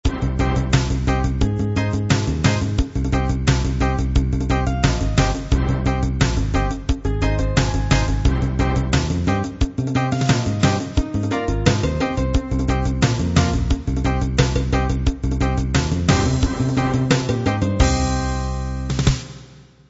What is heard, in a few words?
reggae